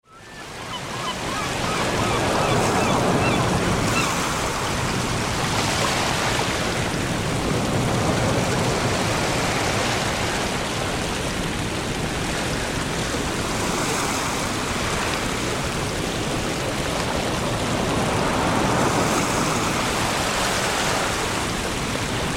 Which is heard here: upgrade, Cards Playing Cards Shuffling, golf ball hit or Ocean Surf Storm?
Ocean Surf Storm